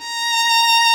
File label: LEGATO VIOLINS, A#4; Instruments & Percussions; InVision Interactive; Lightware VOL-1